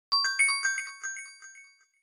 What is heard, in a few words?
jingle - Medal Gold; StarDrone; PS3